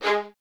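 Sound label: Violins G#3 staccato, String Ensembles; Miroslav Vitous; 11 Violins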